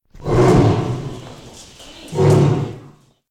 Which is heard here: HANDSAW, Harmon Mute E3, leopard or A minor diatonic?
leopard